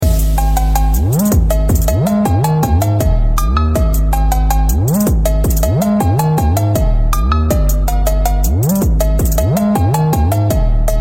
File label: Nokia Arabic Ringtone Phonk Remix